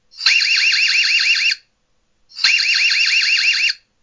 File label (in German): Alarmton